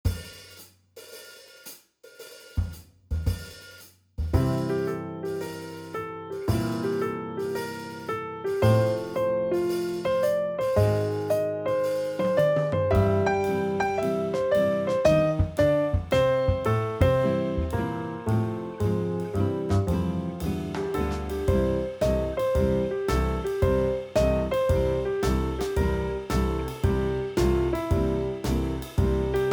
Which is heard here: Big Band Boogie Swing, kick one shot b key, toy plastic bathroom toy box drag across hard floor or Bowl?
Big Band Boogie Swing